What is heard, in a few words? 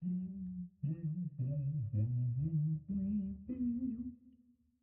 Singing; Mountain SFX